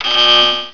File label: ALERT